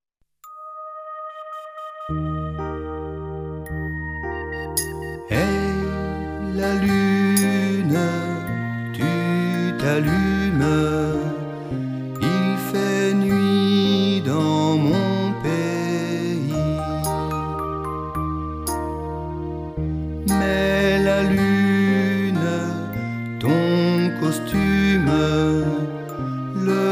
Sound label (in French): ensemble vocal variété jazz chant du monde